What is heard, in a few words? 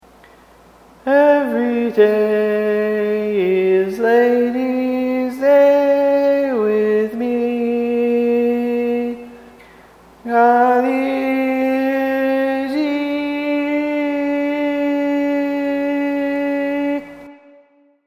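Baritone, barbershop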